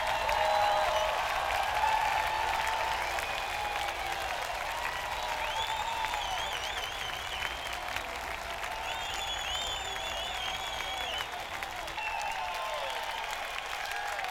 Crowd Tuning

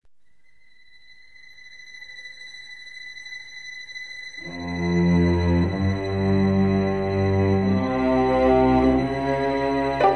Snooper Suspense

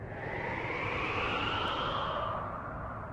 wind; Razor Blades One

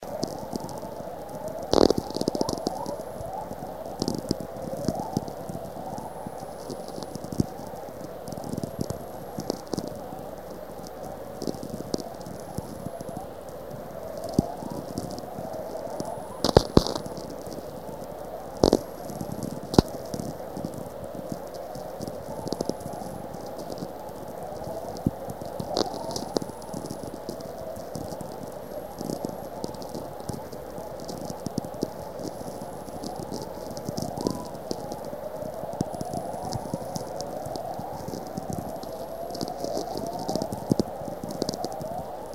Low-pitched Chorus Hay River NWT, auroral chorus

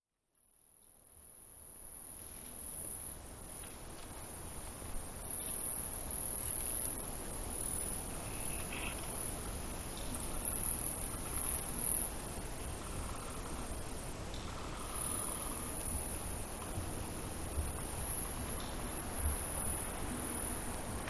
Crickets, Montana; 2000 Rainbow Montana

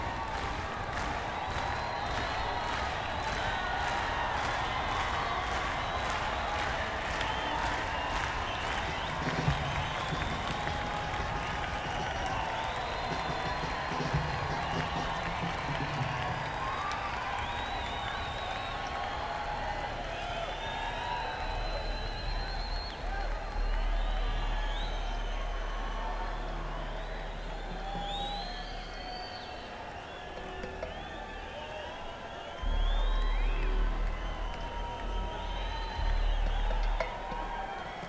Crowd; neumann km84i

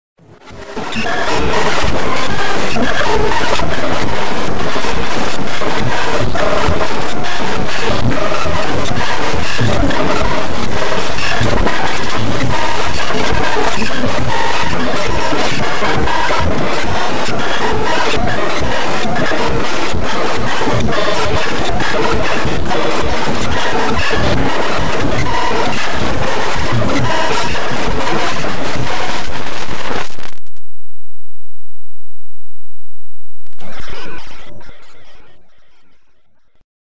Dance beep; demo

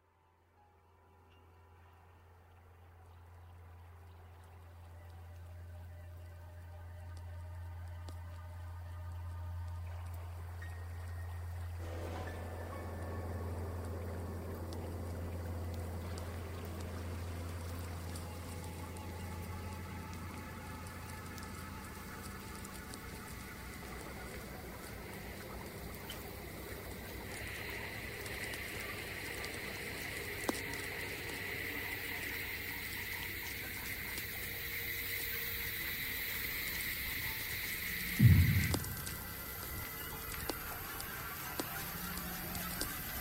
Noise Vibration

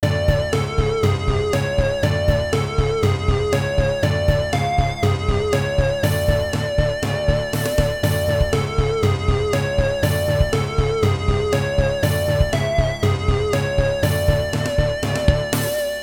Game Over

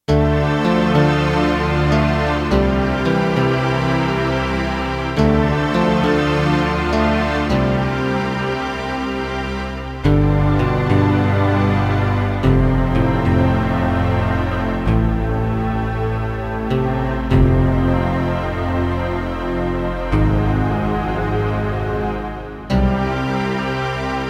Stringuitr; Kawai K1; Presets Bank 2 card A

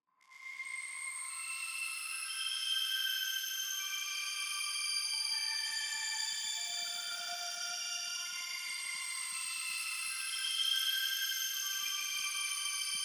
Paleo short Ambience

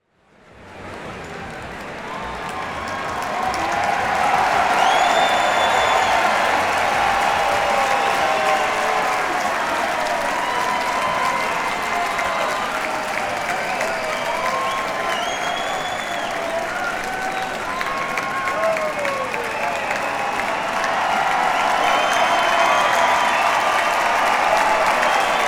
crowd; se8

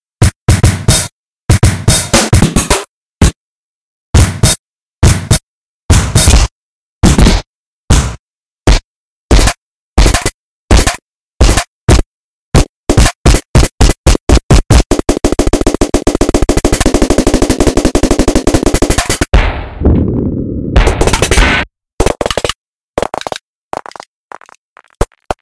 Drums Deconstruction

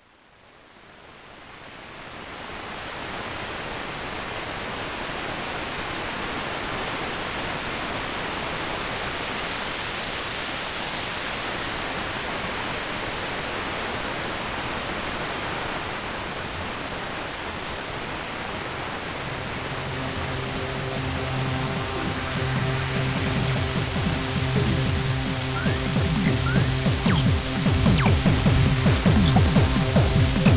rain; megatone

